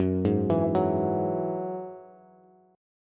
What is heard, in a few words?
Gb 7th Suspended 2nd Gb Bass, Gb7sus2 Chord; arpeggiated; JGuitar